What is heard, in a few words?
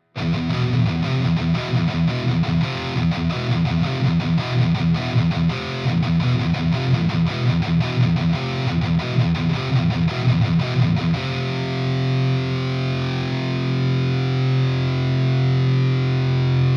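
EL84, guitar; GR56 TAD JJ ULTRA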